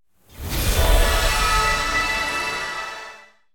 random Symbol Transform; fairyhansel

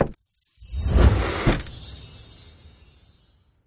paytable Button Click; jingle spin

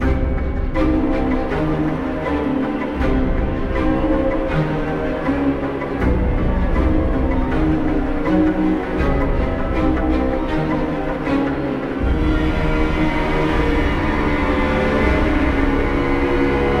intensity; the wolfs night

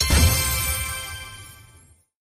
vine Respin Add; jumanji

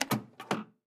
spin Button Click; jack and beanstalk

